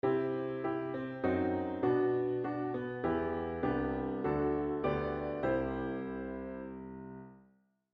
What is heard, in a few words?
lydian scale